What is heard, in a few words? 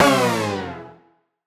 Poly Chord Hits, Ramp Down, E; future rave